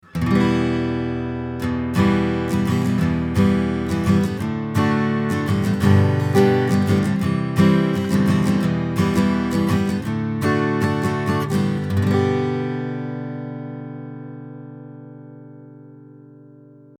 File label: Acoustic Guitar very close 160Hz HPF; Austrian Audio OC818